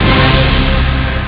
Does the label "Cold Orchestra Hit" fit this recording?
Yes